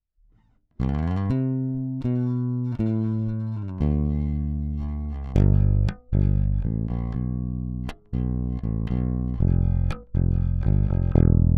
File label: Dry Mix Finger